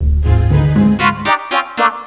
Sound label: Music; Princess and the Pea; SoftKey